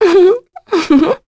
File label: Laugh; Talisha; kingsraid